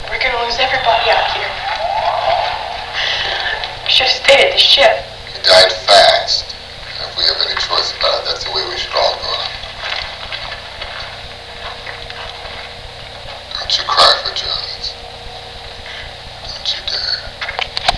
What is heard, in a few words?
cry